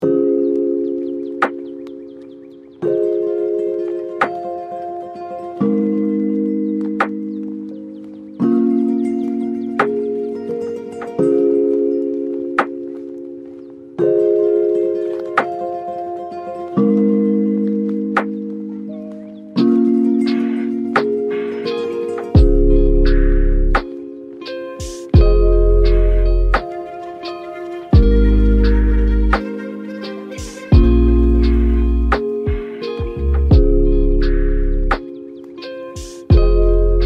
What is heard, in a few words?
music